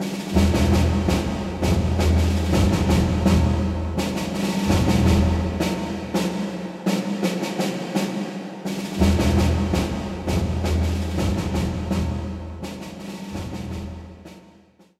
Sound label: round end stalemate music